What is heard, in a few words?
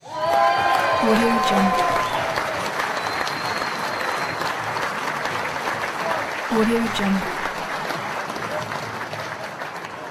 medium sized crowd clapping and cheering